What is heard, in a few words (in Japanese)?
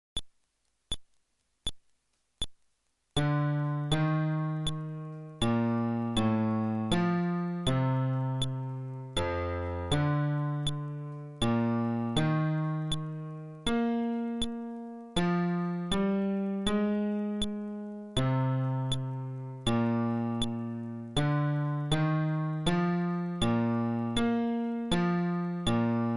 フレットレス (テンポ80)